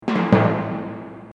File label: attack attempt